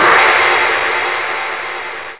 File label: china cym